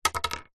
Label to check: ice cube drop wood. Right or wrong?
Right